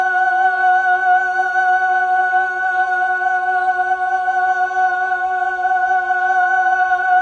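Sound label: Mellotron Female Choir F sharp 3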